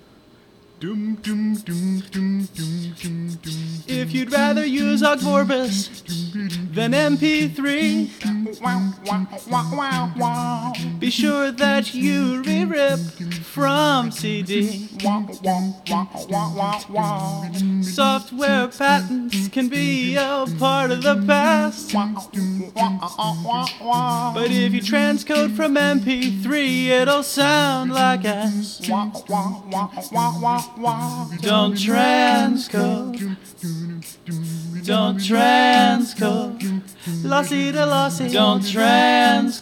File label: heehee